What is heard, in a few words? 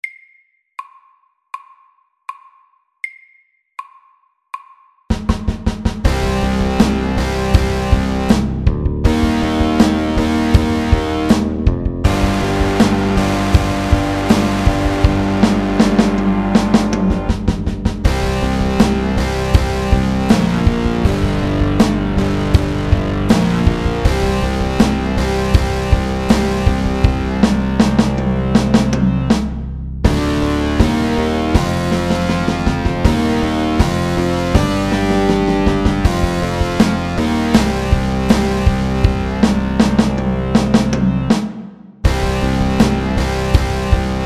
Pirate rock SLOW 80